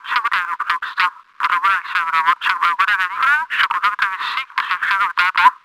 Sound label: conver portable; Scenery; Decors